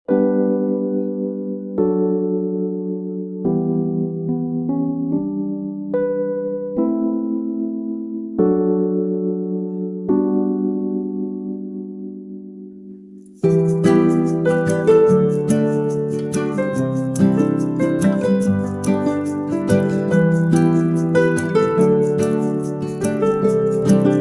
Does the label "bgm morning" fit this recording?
Yes